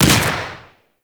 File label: Projectile Explosion; Enemies; Abilities; Warframe; Sentient; Brachiolyst